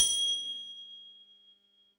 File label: bell